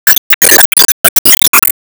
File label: Enemy Teapot Saucer Attack Motion